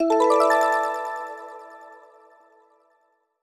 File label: New Item Get; the outbound ghost